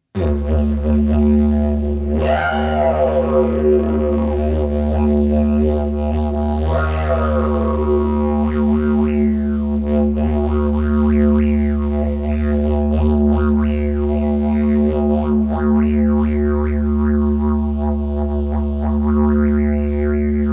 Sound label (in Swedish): nationalsang med bajstrumpet; Digeridoo-lesson